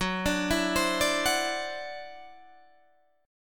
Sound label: E 7th Suspended 2nd Sharp 5th Gb, guitar